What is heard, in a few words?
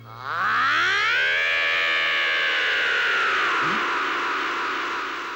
scream; Dragon Ball Z